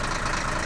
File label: Vehicle Jeep Idle; Playable Tutorial Level